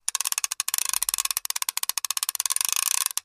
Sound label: fish reel slow; fishing reel